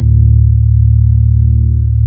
ORG B3 D1; Best Service Gigapack 2

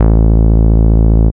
BASS; X-Static Goldmine 1

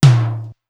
Simmons SDS2000; SDS2000 Factory7

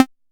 percussion; FutureRetro FR777

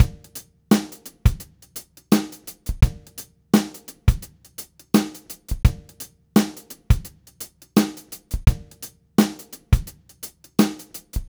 Reggae, Drumset; Sonic Foundry (Sony Creative Software); Joe Vitale Latin Percussion

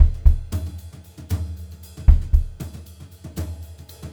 HOTROD VARI, Brushed Drum Loops

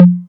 Conga Lo, Novation Drumstation; DrumstationV1

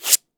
SANDPAPER; AKAI S-Series CD-ROM Sound Library VOL-5

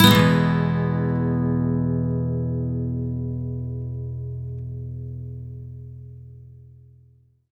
MAJ SWEEP E, Electric & Acoustic Guitar Loops; SWEEPS; USB Soundscan vol.04; AKAI

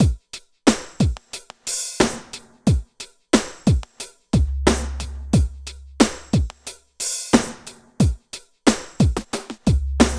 98 LOOP -R; East West - Phat & Phunky CD2; 90's Sample CDs

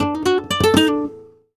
Remates De Pulgar, WAV Guitar, Flamenco; ProSamples vol.46 - Flamenco

